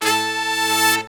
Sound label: BRS SHRT, R&R Horns, Sect; Roland L-CDX-03